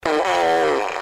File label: FART MACHINE